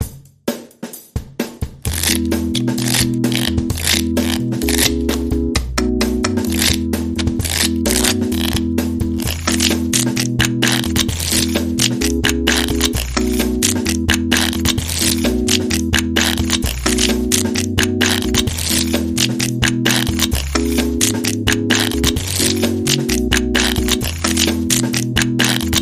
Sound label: Deck The Casino Halls; Trad (PD)